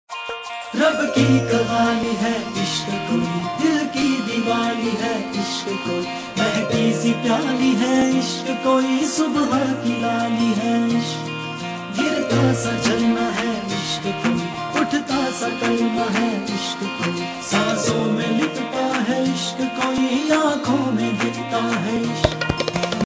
male, ringtones

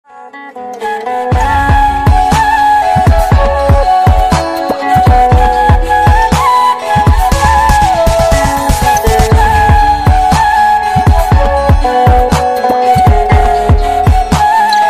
flute ringtone

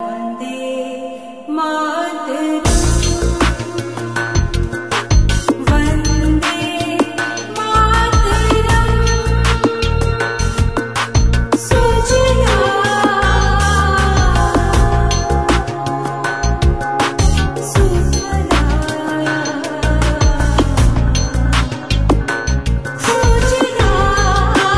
Vande Matram Ambient, Devotional ringtones

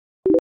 chat beep; Facebook; Sms ringtones

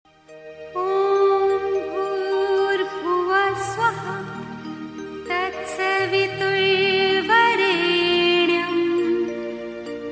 gayatri mantra, Devotional ringtones

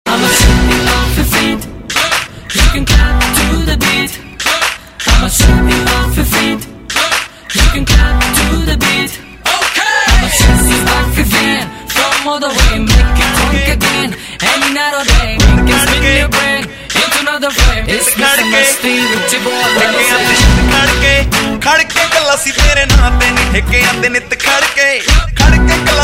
Clap on the beat, ringtone

